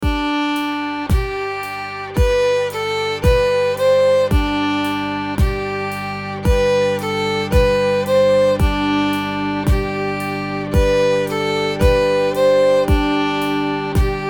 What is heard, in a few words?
practice loops crooked loop, fiddle